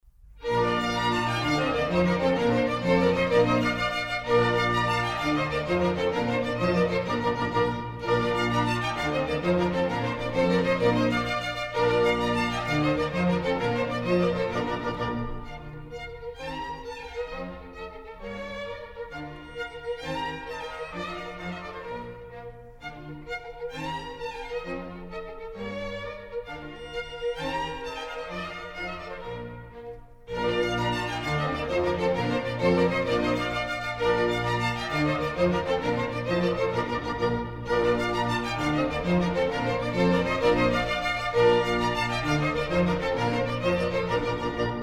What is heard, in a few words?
Contredanses, Bb Major; Mozart; Dances and Marches